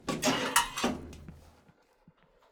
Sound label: Submarine Lever